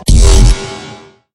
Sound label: wobble